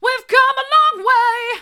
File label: we've come along way hard, vocal